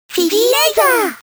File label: fizzy lazer lofi